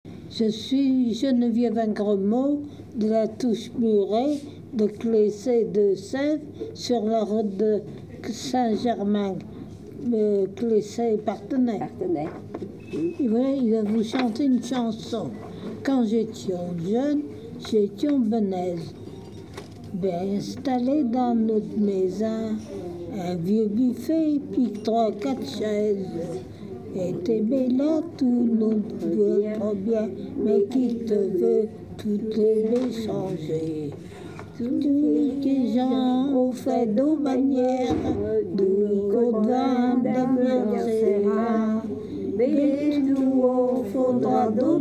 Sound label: quartet